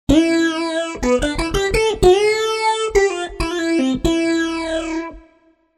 Phaser; TURBO VL

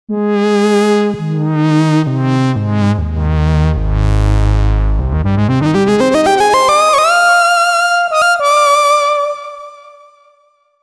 SAWS; TURBO VL

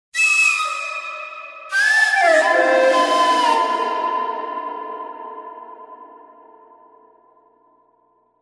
Wet Fone; TURBO VL

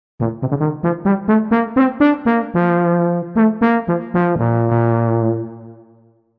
Euphnium; TURBO VL

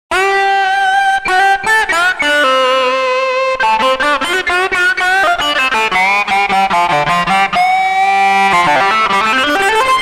Talk Box; TURBO VL

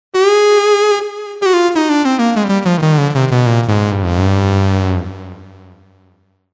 Saw Lead; TURBO VL

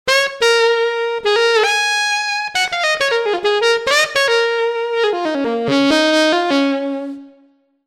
Expr Alto; TURBO VL

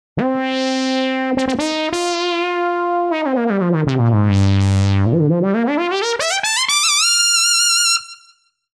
EWI ARP; TURBO VL

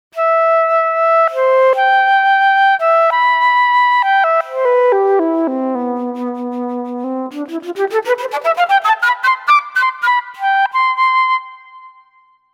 Class Flt; TURBO VL

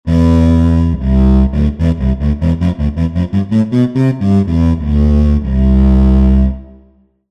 bow bass; TURBO VL

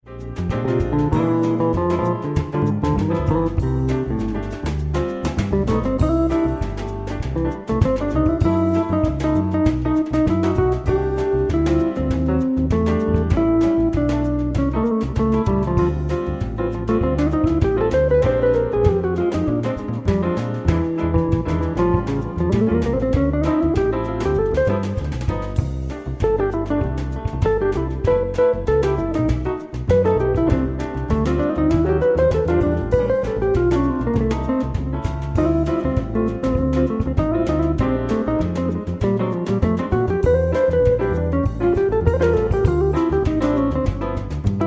Meditation Solo; Akai S5000 MegaPiano; Patchman

